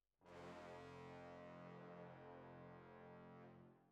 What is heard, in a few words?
Horns